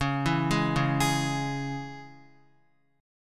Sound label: Db Minor Db down Guitar; arpeggiated; 4E,4A,5D,5G,5C,6F